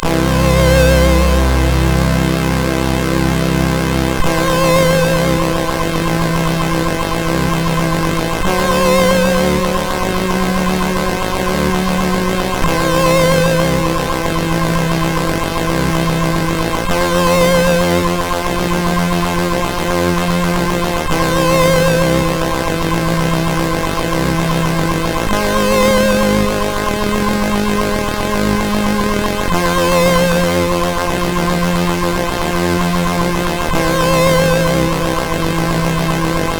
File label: E-Load loader, CSG8580R5; hvsc